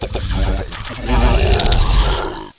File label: Boss Death; BOOM